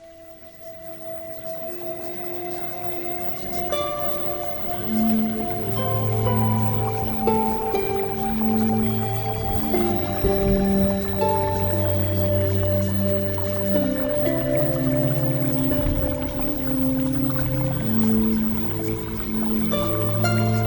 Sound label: Music